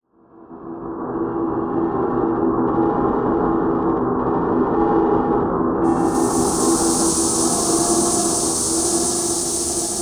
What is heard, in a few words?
Rhythmic Ambiance; Module Production Element Title Imaging; Imaging Elements